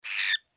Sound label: cockatiel